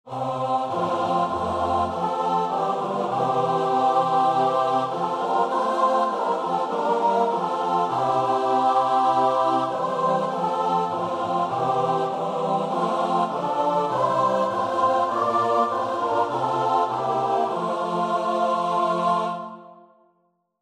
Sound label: choir; i love thy kingdom